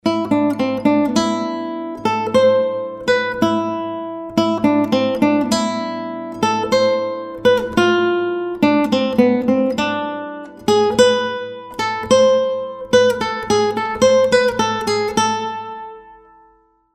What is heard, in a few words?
Guitar